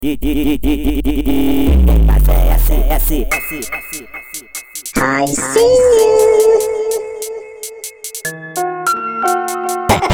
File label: FUNK Sped Up